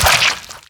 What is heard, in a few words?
blood, gore; garrysmod; nuke